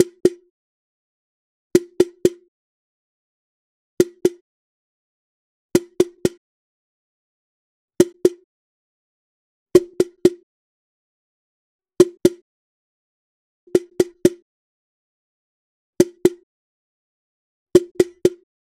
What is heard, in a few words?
Cajon High (Snare Wire), Udu (Ibo) & Cajon Drums